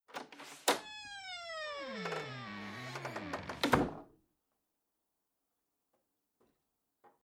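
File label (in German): Türknarzen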